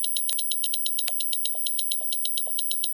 Processing Loop, Computer Sound FX; SoundBits; Computer Sound FX 2.0

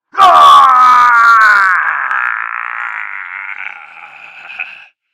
scream; hydra; hl2

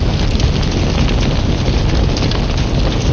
fire ambience; hl2

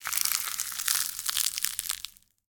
Egg, Break, Creature, Crunch, Crack, Various; Mattia Cellotto; Crunch Mode

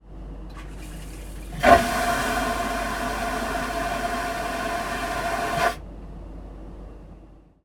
Toilet Flush Airplane Close In Flight; InspectorJ

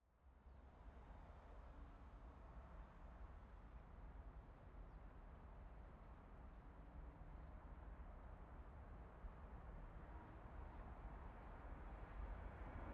Planes, Takeoff; aXLsound; Planes - Takeoffs and Landings

